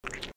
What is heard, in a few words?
Suck; Lewd SFX